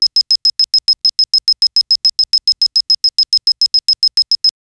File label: computers